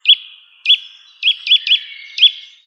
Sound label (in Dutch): boomklever